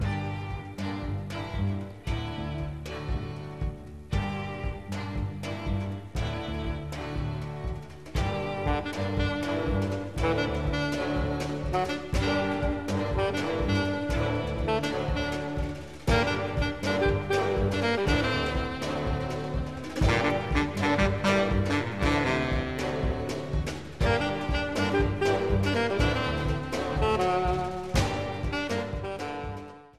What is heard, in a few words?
birds lament